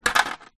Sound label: household freezer ice cubes x2 drop into plastic freezer tray